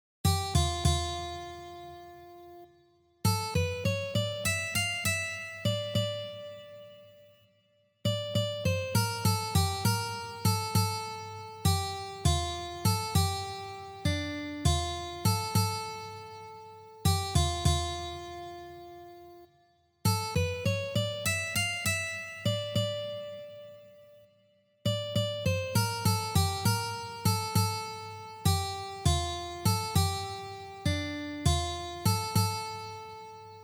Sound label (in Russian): na gitare